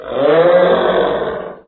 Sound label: snork agroed howl, mutants; STALKER; customnpcs